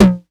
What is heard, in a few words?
909 TOMS; Wizoo - Platinum 24 Electronic; 909 TOMS P1